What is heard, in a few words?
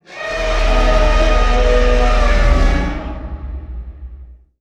Roar & Scream, Vocals Monster; Sony Pictures Sound Effects Series